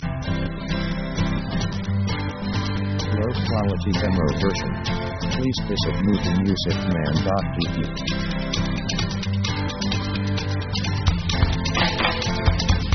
blue grass rave

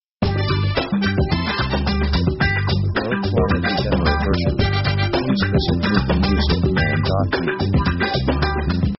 pop 70s funk sketch

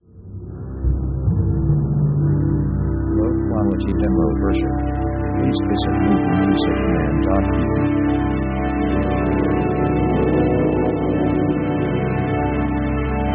HOUSE OF EVIL, dark mood

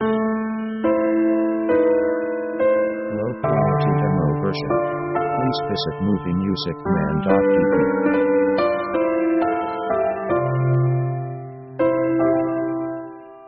piano melancholic sketch; orchestra themes

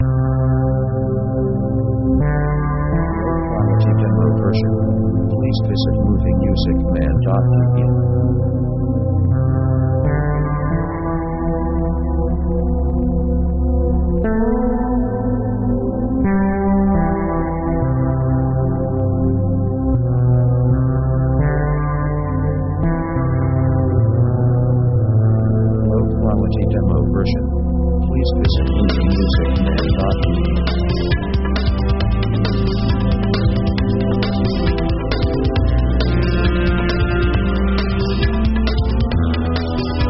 jazz ballad

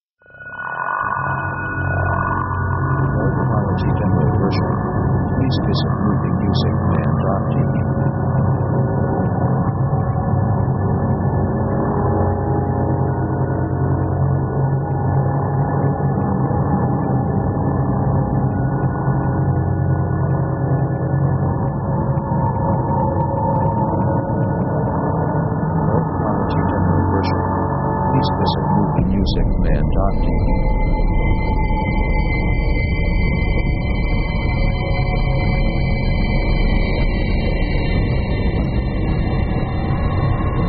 alien fear; synth themes

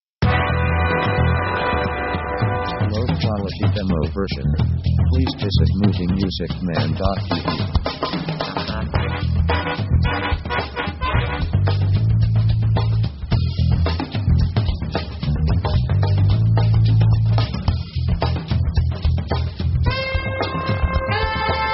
70s big band score

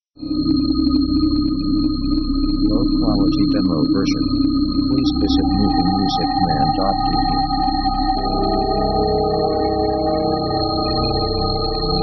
thriller; synth themes

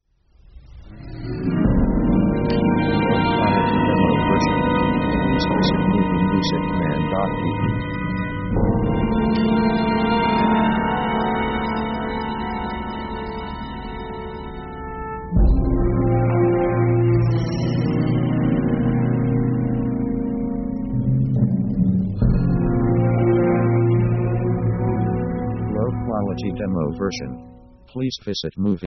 Orchestra Gliss; dark; Dark mood

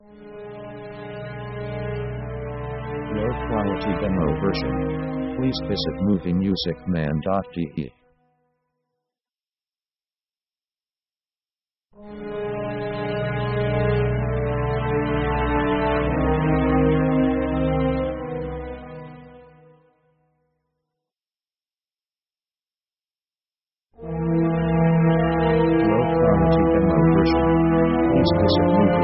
orc dark adagio; slow

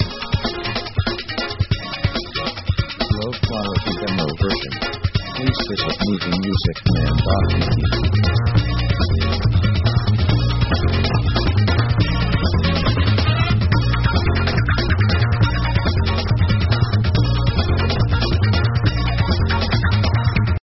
action traditional

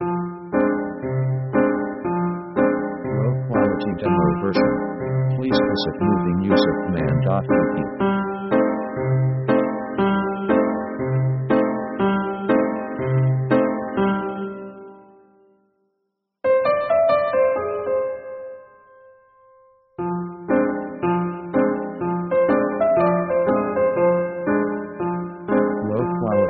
orc strange marching; Basically slow

